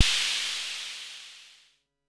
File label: FX; MPC DSM-2